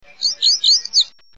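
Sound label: Lazuli Bunting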